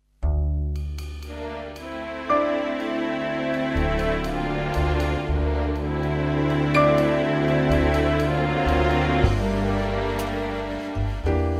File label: brass minus